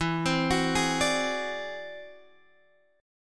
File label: E Augmented 9th E down Guitar Open G